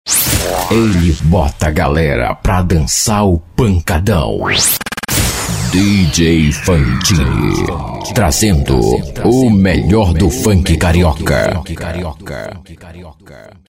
trazendo melhor do funk

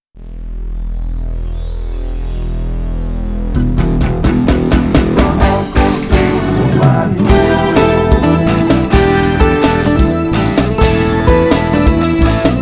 forró abertura

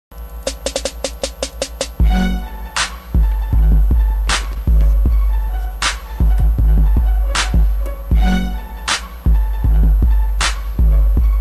rap musica

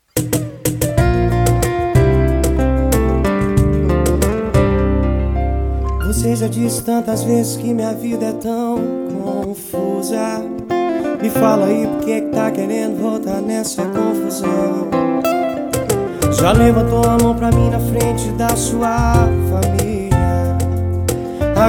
sertanejo universitário sofrência

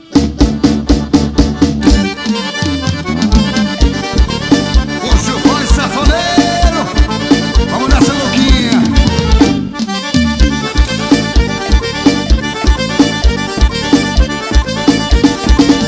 forro

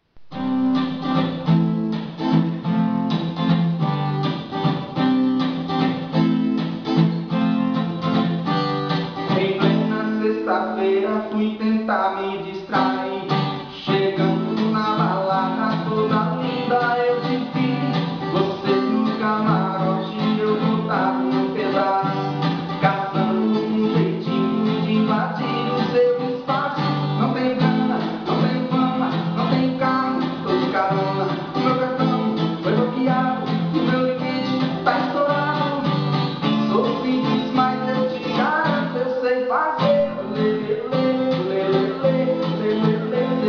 voz violao

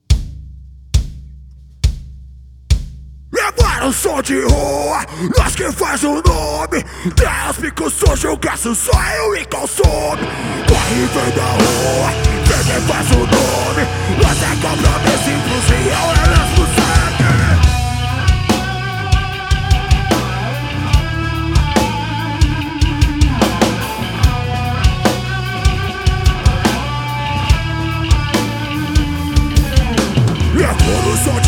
ao vivo no estudio; laboratori

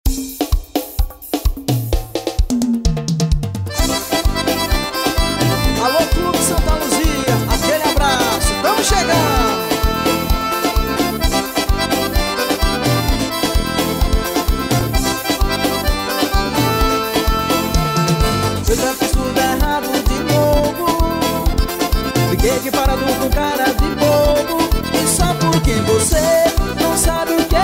forró

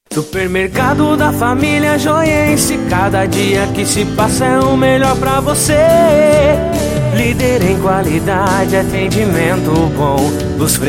jingle supermercado; super mercado bottura; vagner audio producoes

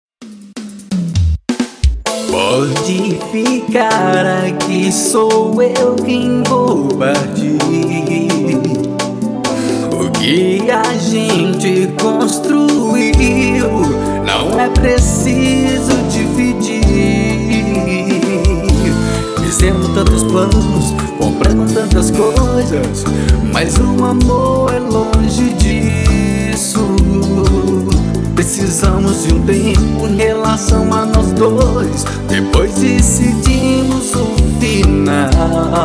meu violao e nosso cachorro

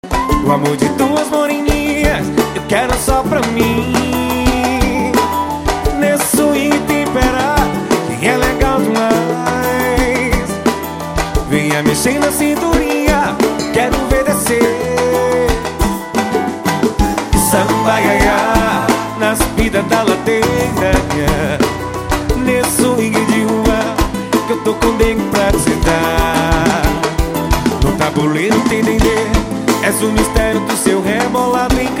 suingue de rua

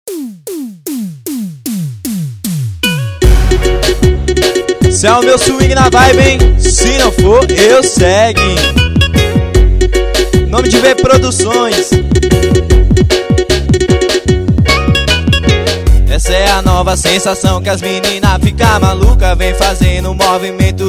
swing triangulo

